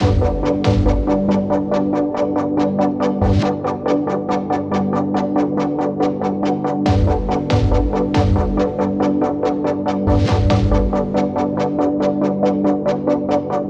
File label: Auto flutter Full mix